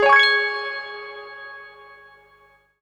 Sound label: Piano